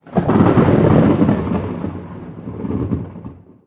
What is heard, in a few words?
Thunder; XiuXianGame